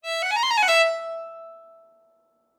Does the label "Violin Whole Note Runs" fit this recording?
Yes